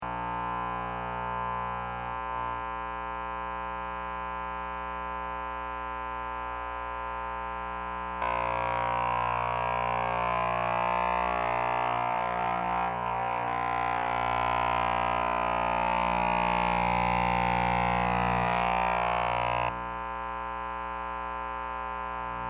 Amp Noise And Static, Electricity, voltage, buzzing, fluctuating; Noise Sound Effects; LYN095 package of Noise & Static